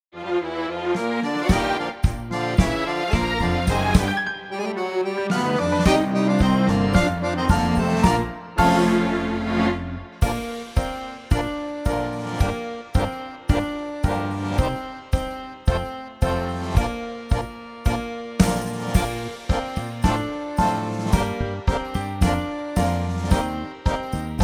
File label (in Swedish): Spanien Tango, trombon trumpet